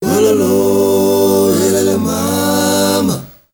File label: MALE, CHOIRS; Spectrasonics; Heart of Africa 2